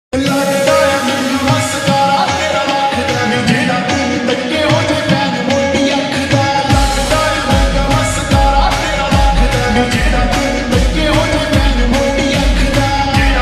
slow reverb punjabi ringtone

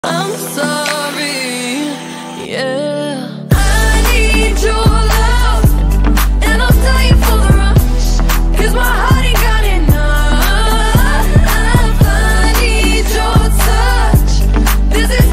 english ringtone

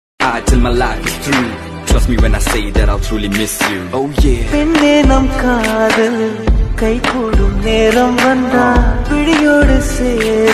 Remixed tamil ringtone

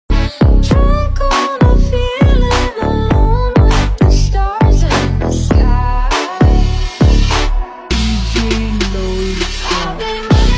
DJ remix english ringtone

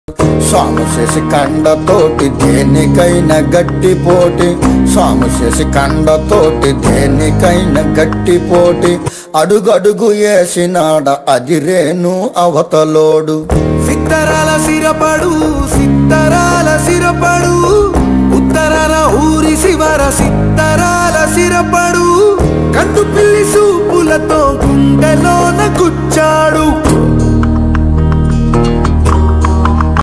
tamil ringtone

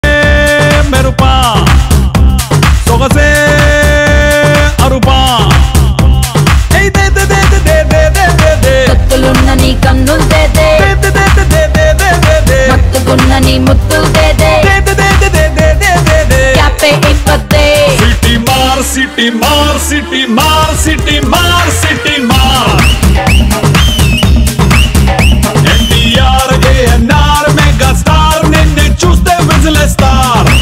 tamil ringtone

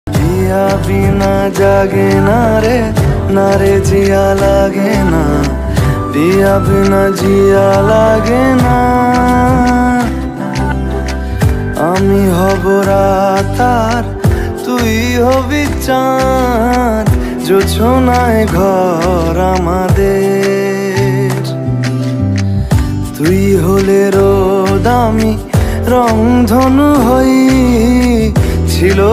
bengali ringtone

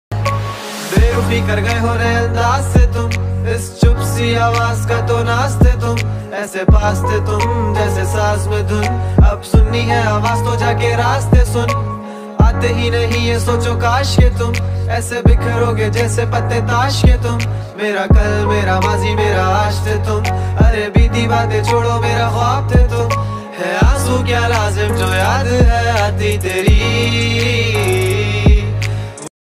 hindi rap ringtone

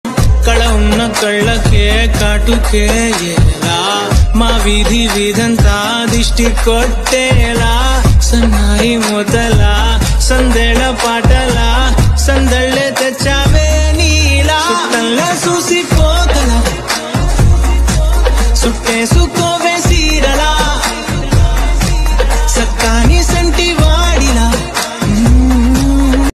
telugu ringtone

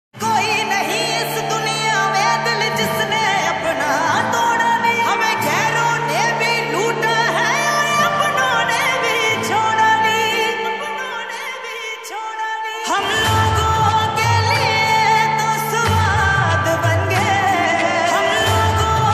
(slowed) punjabi ringtone

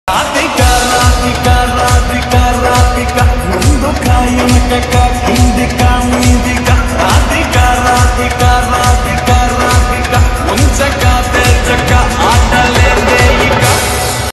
telugu ringtone

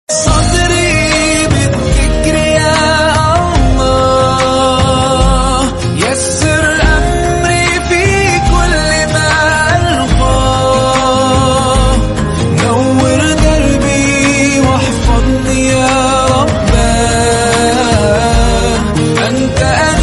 arabic ringtone

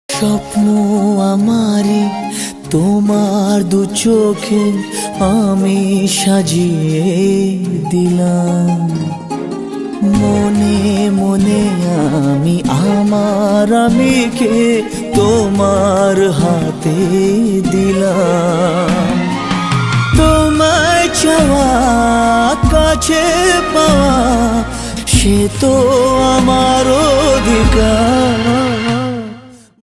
bengali ringtone

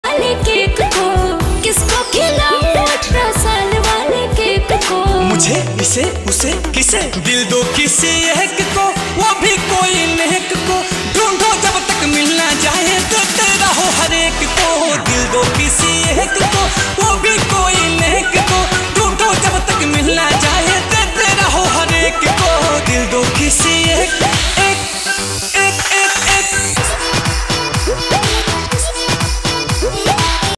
bhojpuri ringtone

